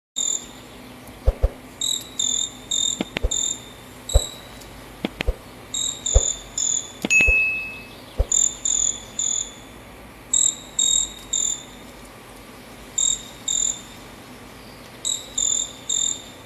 canto do cacula

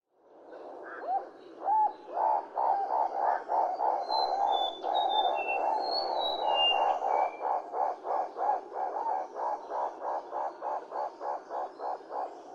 Canto do turaco de bico amarelo

